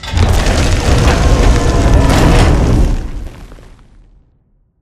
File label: First Floor Lower; Pre Ash Fight; Titanfall 2; Boomtown